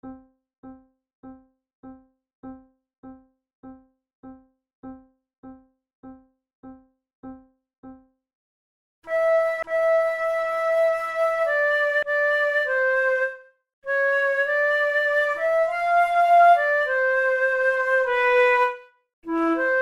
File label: duet